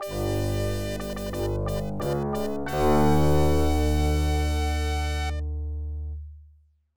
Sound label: Victory or Defeat; Beast Wrestler; Beast Warriors; Genesis